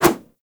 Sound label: Generic Flip Whoosh; Warframe; Characters